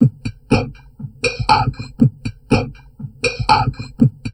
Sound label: TALK, Drum Loops Crazy Processed; USB Soundscan vol.07; AKAI